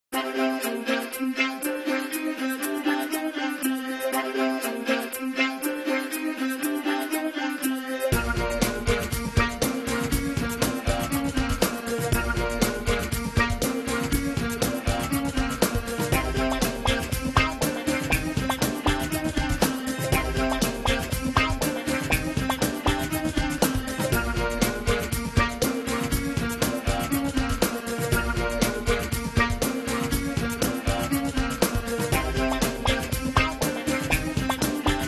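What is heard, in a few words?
background beat; Autoplay Menu Designer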